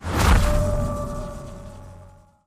attack; Skillzgaming; battle royale desktop

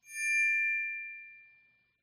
mult collect indicator; hades River Of Souls; Fantasma

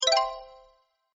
bar filled; Skillzgaming; fruit Blast Desktop

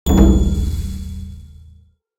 continue; adelia The Fortune Wielder; Foxium

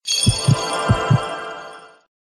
heart plus one life; Recode; jewel Quest Riches

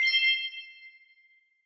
nebula epic; Skillzgaming; gems odyssey 92 desktop

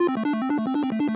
reel Spin; Diamond Empire

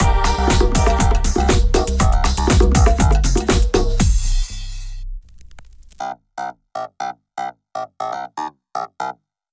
Bonus Music Outro; genesis; euro Golden Cup Desktop

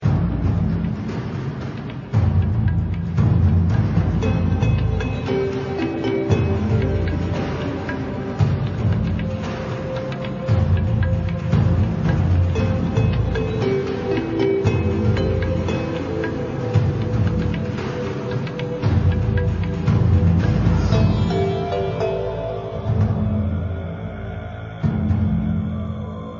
bonus level; Recode; jewel Quest Riches

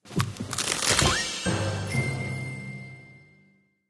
gamble win; Dragons Myth

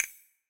menu open; Skillzgaming; fruit Blast 92 Desktop

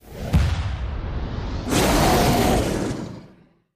dragon boss intro; Skillzgaming; battle royale desktop

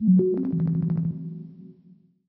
Gamble game start; Rabcat; Classic 243

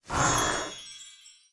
chest open; adelia The Fortune Wielder; Foxium

